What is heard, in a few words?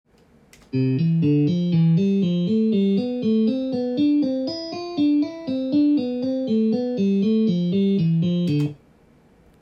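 c major sequence, guitar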